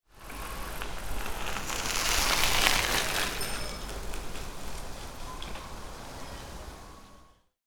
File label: Bicycle Passing On Dirt Road